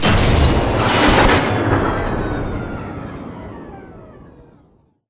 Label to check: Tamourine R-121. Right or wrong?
Wrong